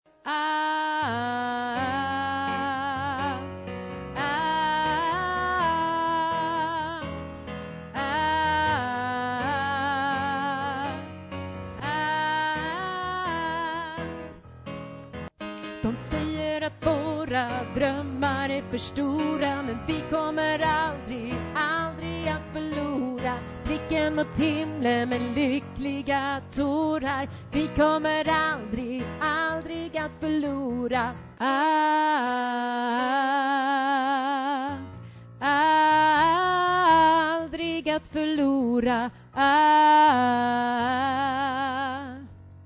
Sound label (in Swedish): alt, stammor